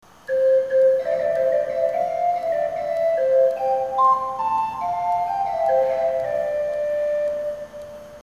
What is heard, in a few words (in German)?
Floete, Orgeln; kirchenmusik kaarst; orgeln und glocken